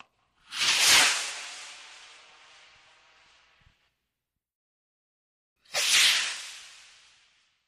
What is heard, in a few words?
Rocket Flare Gun: Firing Into The Sky. Stereo; small rockets